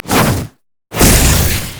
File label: disintegrate; LoL; annie base